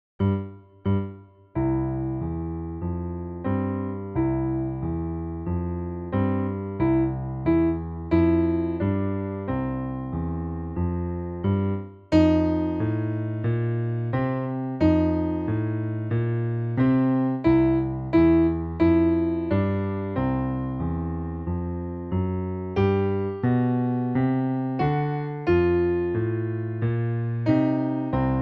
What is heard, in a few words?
blues